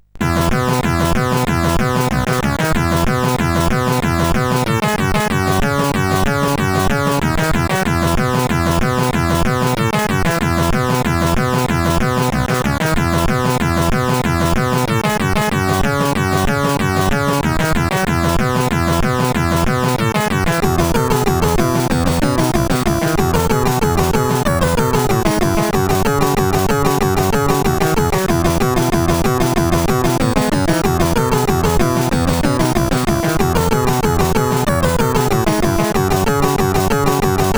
Funny Music, sid CSG8580R5

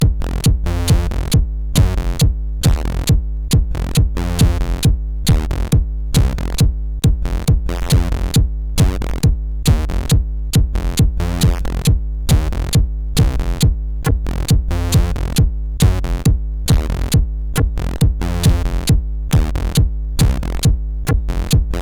Gabber CSG8580R5

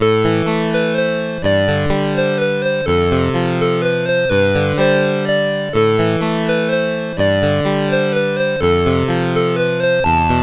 New Jump end, CSG8580R5

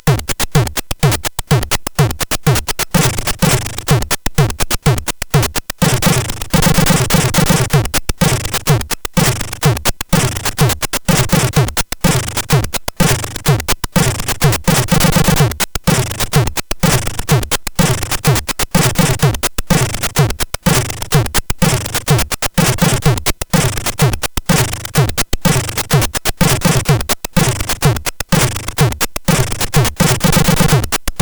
Drums, sid, CSG8580R5

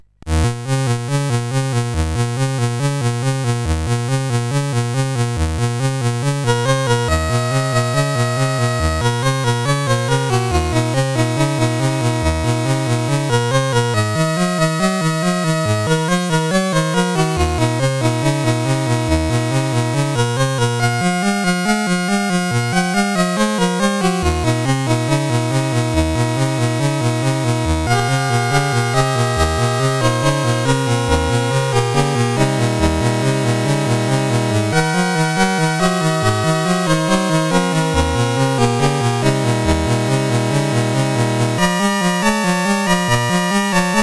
Gatlinburg Boogy, sid CSG8580R5; Master Composer

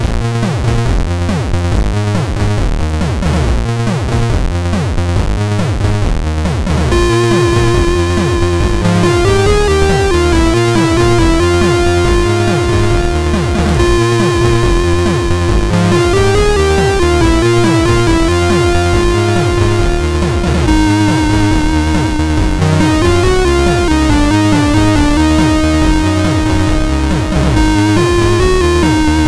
sid, MOS6581R2; Tunening In

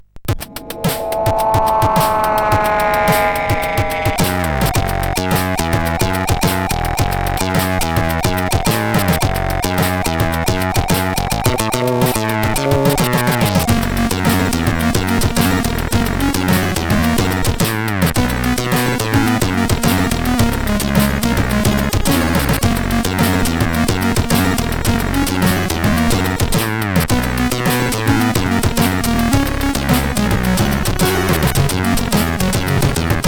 Jingle Bells Funk, CSG8580R5

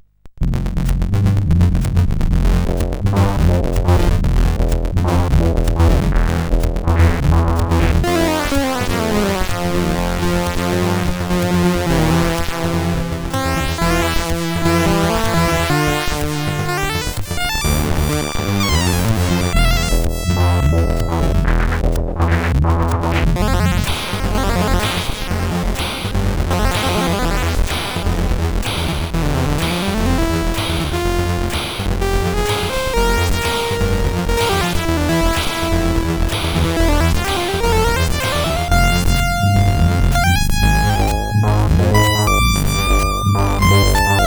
Minimal, sid CSG8580R5

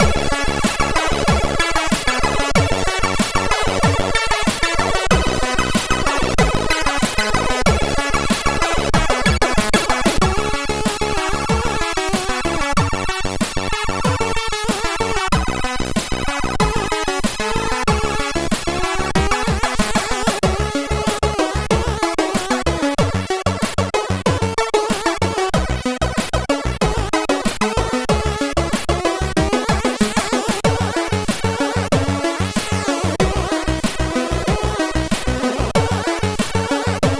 MOS 6581R3; Old City

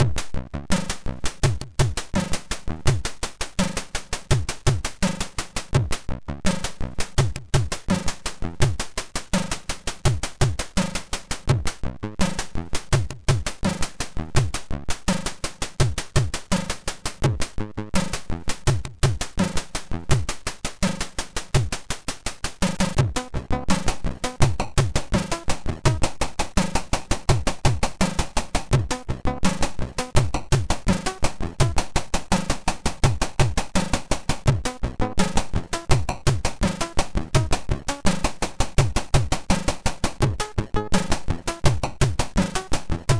Happy Birthday sid MOS6581R2

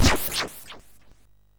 SFX, MOS6581; Narco Police; MOS6581R3

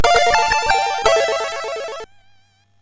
Jingles, MOS6581R4 sid

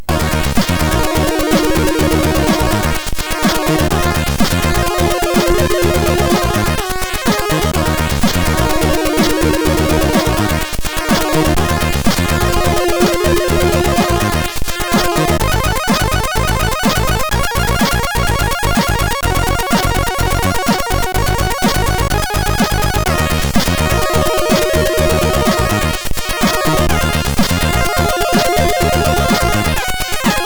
Choir inspired stuff, sid MOS6581R4; hvsc

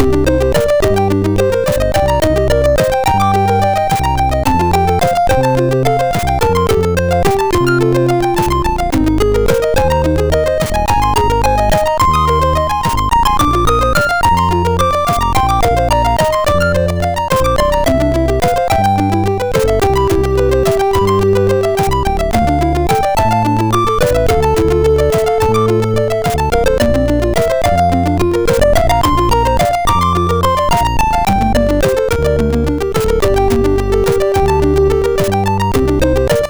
Rise and Sine, MOS6581R2; Wijnhoven Joachim

